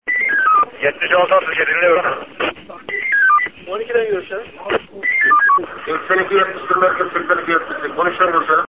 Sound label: police wireless connection